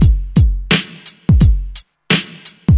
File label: 86 bpm drum loop g key